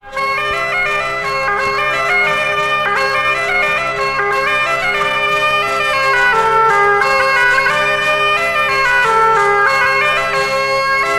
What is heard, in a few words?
alto